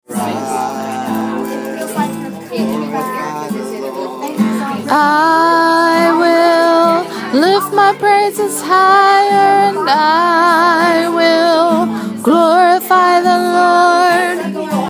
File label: alto